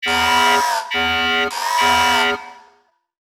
Warning; SE Ing Other Rule#20 (SE Kati02 Warning)

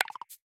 App Positive

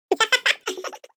Laugh; Lethal Company

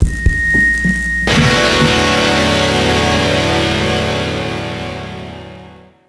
Guitar Noise; gamerip; ragnarok; max payne

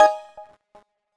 main cursor

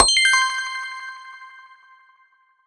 UI Pause; Skylanders Cloud Patrol; Arcade, iOS, Mobile

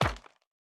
Bounce Furniture

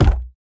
Impact Wood; Going Under

